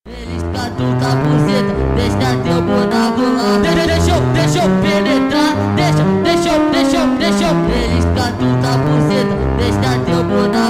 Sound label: dark fantasy funk 128